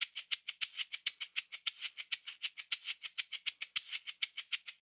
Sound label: Shaker C; перкуссия